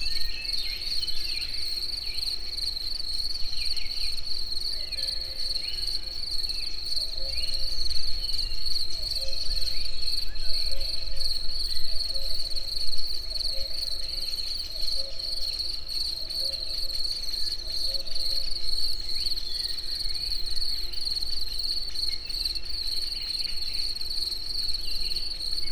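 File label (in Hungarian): kakukkos egerturistaház, rovarok; tucsok; allathangok; termeszetben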